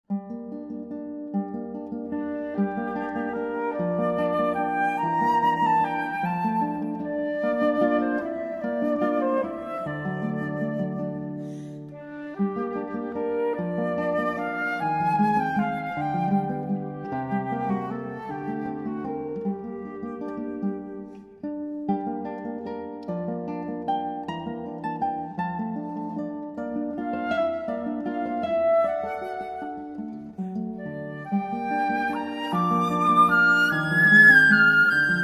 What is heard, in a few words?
Flute and Guitar; Fauré Sicilienne, Op. 78